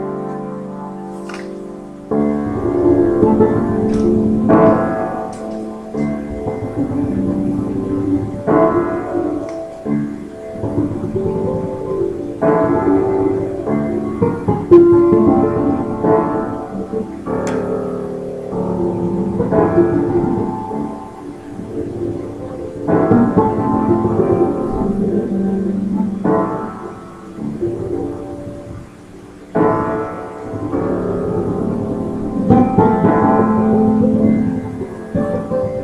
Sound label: rain waltz; weeklybeats 2012